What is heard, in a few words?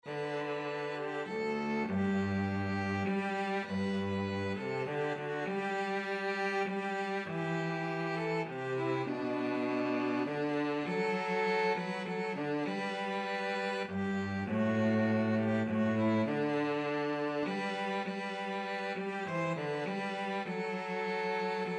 violin cello duet